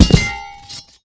steam fall; The Elder Scrolls III - Morrowind

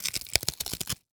Package Cardboard Package Tearing Open; Emergency; Emergency Effects

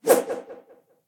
slashes; SyrinScape; Temple of Urgathoa

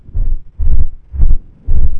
Dwemer Fan; The Elder Scrolls III - Morrowind; Morrowind GOTY GOG

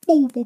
ball bopper; SyrinScape; Syrinscape unplugged; Jungleplanet dinolaser battle

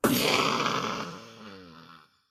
splosions; SyrinScape; Syrinscape unplugged; Jungleplanet dinolaser battle

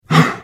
Hit; The Elder Scrolls III - Morrowind

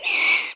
roar

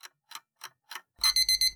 time up; Papers Please

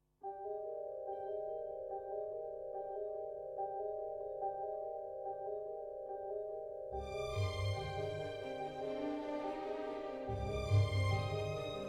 no choir

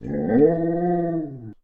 Weird Dog Beast; SyrinScape; Library Depths

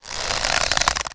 bow PULL; The Elder Scrolls III - Morrowind; Morrowind GOTY GOG

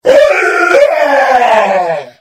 Hit; The Elder Scrolls III - Morrowind

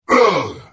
Hit; The Elder Scrolls III - Morrowind

